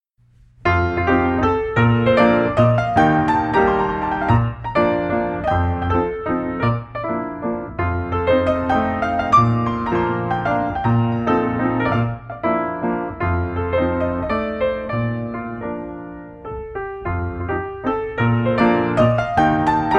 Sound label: Mazurka in B flat